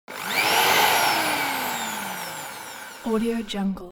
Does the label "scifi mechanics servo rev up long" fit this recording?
Yes